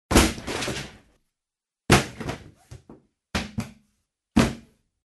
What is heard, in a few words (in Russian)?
zvuki biblioteki